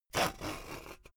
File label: saw use long slow